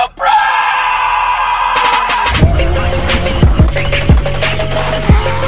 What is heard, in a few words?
alert sound